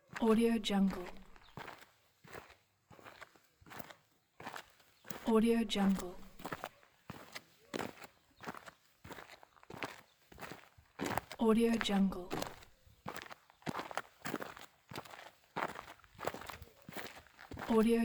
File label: steps and running steps